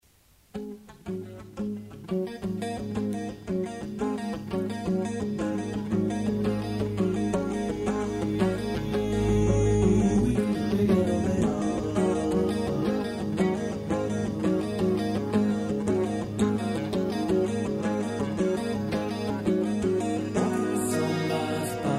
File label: Octaver